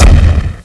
grenade, weapons; dmc

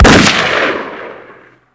357 shot, weapons; cstrike

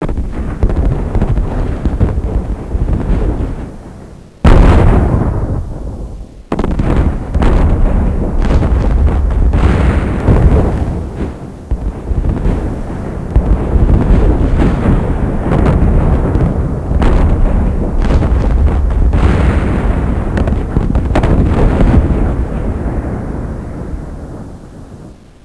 ambient; ProjectMobius; KridonV r2

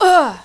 pain, male

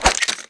AMMO; svencoop; aliens items

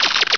build

